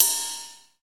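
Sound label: Korg Ride Cymbal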